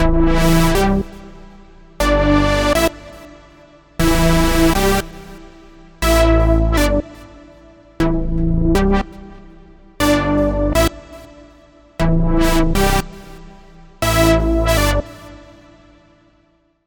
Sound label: Festival Chord